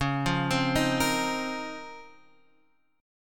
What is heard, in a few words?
C sharp Major 13th C sharp x,4,3,5,4,6 down Guitar Standard; C#M13 arpeggiated; JGuitar